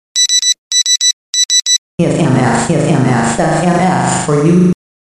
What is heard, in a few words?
Sms For You